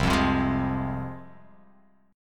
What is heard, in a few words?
C Suspended 4th Sharp 5th, C, down, chord; Csus4#5 strummed; JGuitar